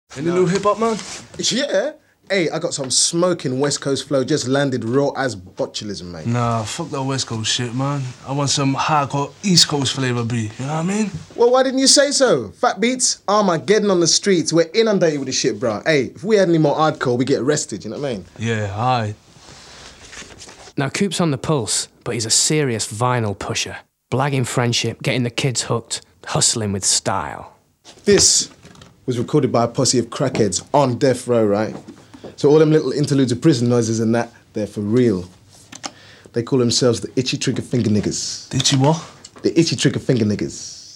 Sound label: hip hop intro